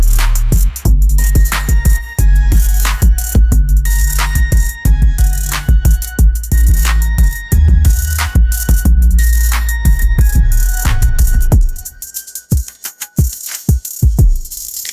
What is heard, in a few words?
hip hop beat, ringtones